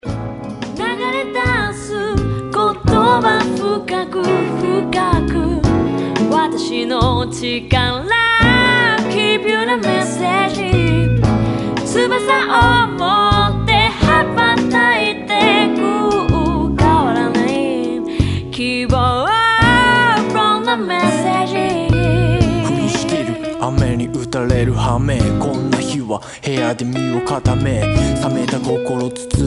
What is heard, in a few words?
message song